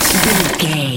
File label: gun auto barrel spin med stop